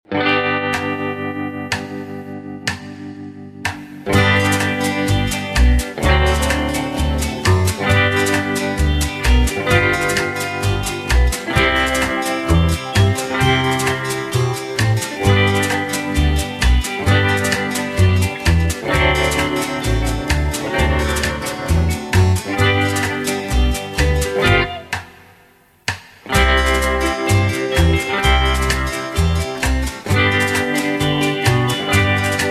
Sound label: (G) No Backing Vocals